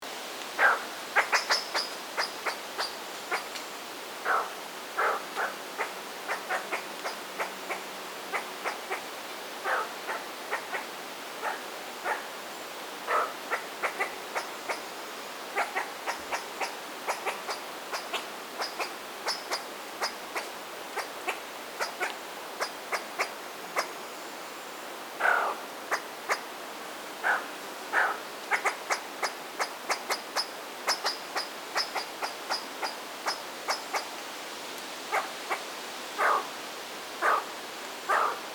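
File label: hazel grouse voice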